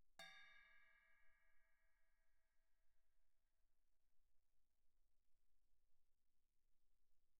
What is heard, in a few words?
chinese stick bell pp, suspended cymbals; Percussion